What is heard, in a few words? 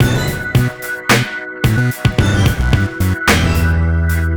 ringtone, hiphop rnb; Google; NewWave Labs